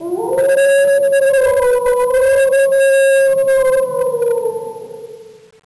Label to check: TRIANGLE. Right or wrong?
Wrong